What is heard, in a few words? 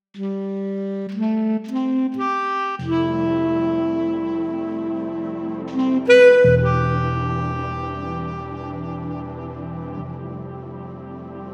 Tenor Sax